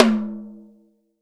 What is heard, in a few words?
TOM, Real Drum Kit, Rock Kit